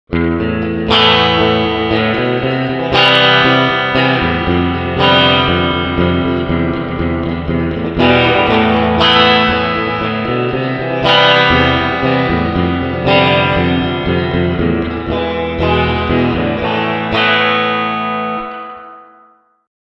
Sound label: Twang